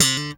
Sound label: POP HMR C4, slap bass